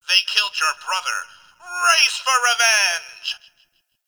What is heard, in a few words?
Game Bark; Atomicycle; Warframe; Ambience; NineteenNinetyNine